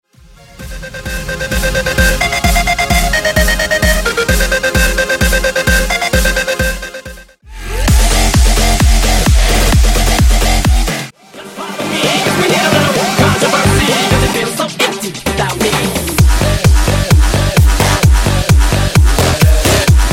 G Funk Bootleg; 10 Hybrid Edits

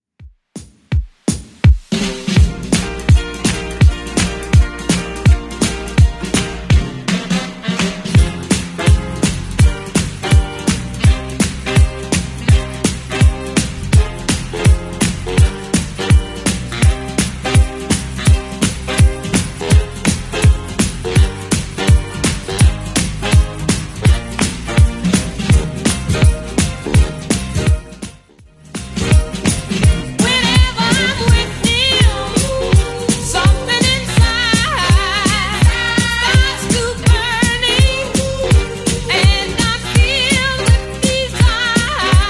70s Redrum 166 bpm CLEAN

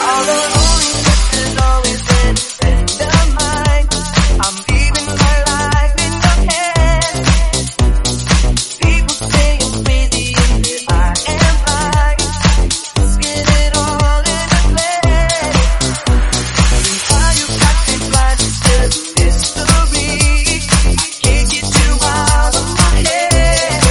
116bpm C

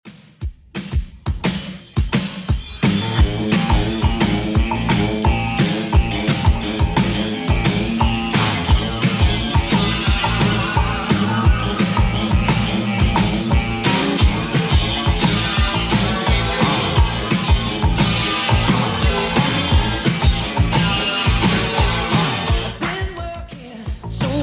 80s Redrum 174bpm

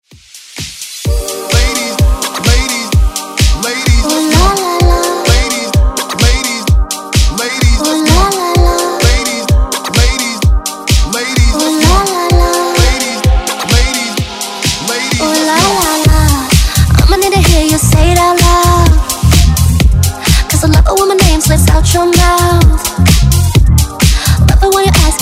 Dance Redrum

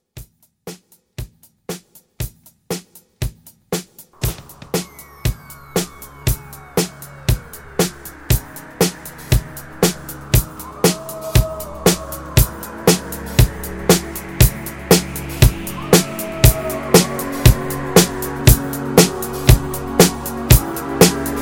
80s Halloween Re Drum 118

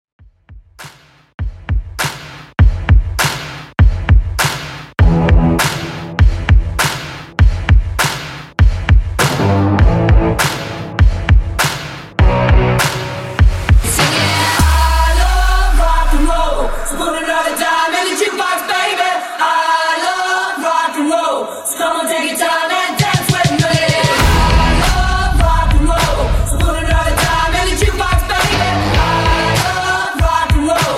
EPIC INTRO

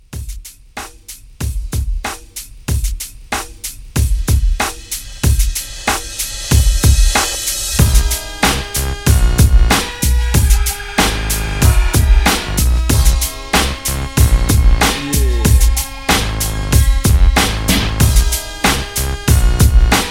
90s Hiphop Old School

